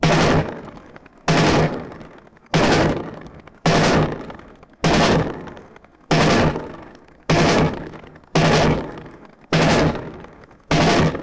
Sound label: machine gun fire